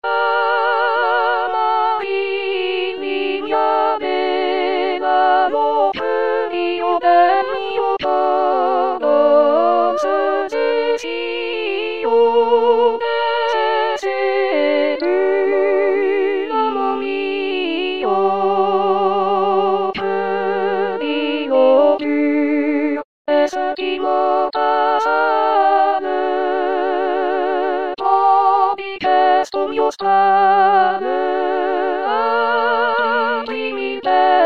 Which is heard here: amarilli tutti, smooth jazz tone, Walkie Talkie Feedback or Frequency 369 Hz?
amarilli tutti